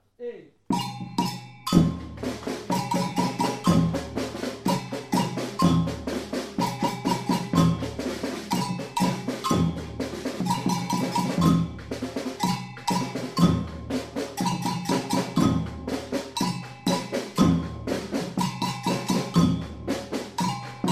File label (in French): samba reggae agogo et caisse claire, batucada